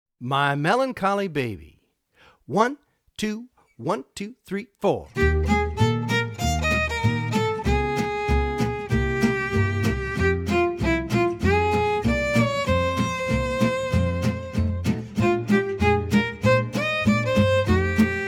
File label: Melody Regular, swing jazz